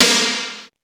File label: SNR, Cheez Snrs